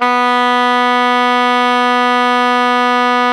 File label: SOPRANO B 2, Saxophones; Greytsounds; Philip Wolfe David Crozier's Saxophones